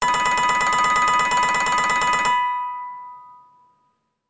Chrome, ringtones, HTC One M7

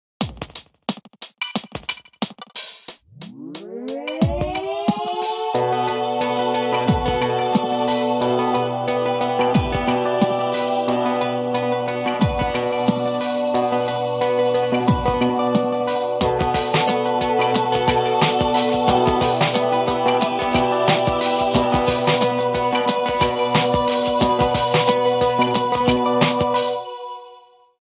Calm breeze; 6230i

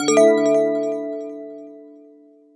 system power on; BlackBerry OS-10

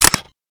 cam snap, LG G3

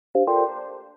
Fold close; Nokia N76

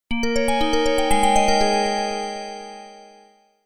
sms; WAO

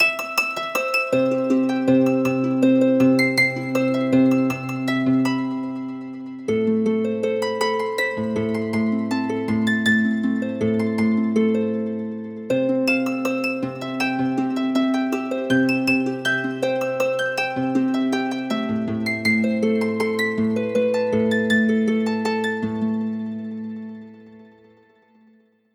Dragonfly, alarms; Alcatel; U5

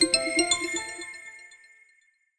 Boot Up; HTC Touch Diamond

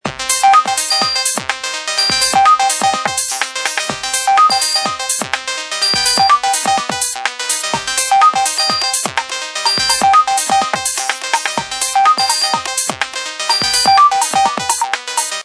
Gamer, Ringtone; HTC Freestyle

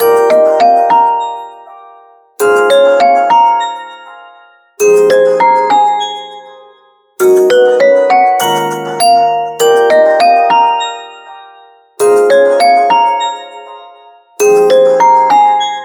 Dreamy jazz; Realme 8; ringtones

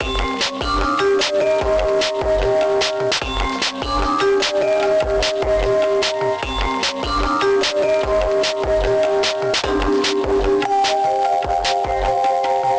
Walk The Bubble; Kyocera; Marbl

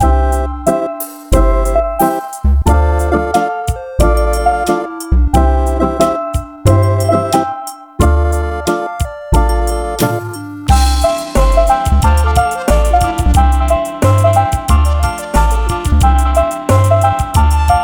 Ringtone Pop, ringtones; Tem90; i-mobile; i-style-3

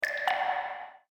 Waterdrop (Off), Event sounds; LG GB255g

